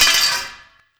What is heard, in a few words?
Scaffolding Collision